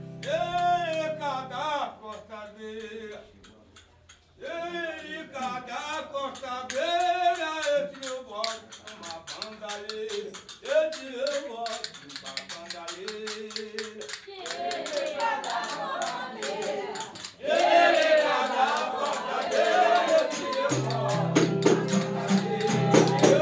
fanti baiao de princesas

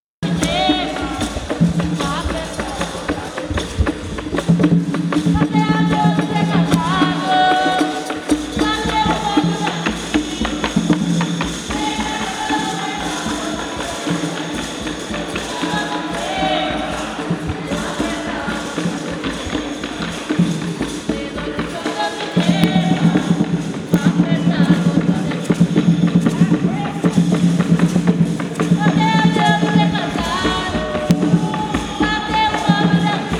batuque de umbigada capivari

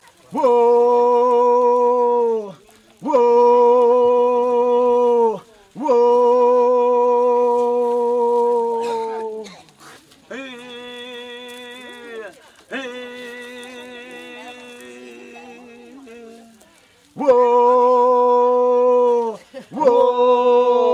timbiras

